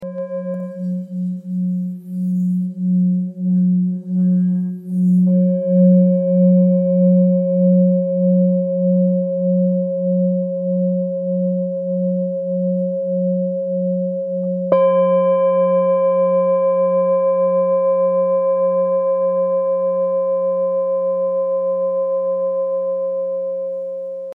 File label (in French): Full moon fa diese